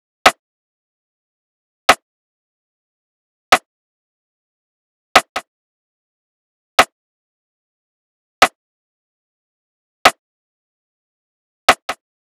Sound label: Drum Loop 147 BPM Rim; Loop Stems; Cymatics; Vibes II Drum Loop Collection; Sparkles